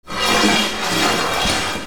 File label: FRICTION